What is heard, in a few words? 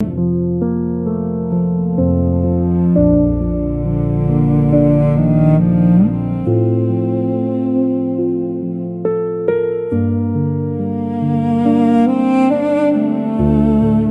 loop; bgm